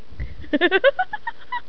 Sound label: giggle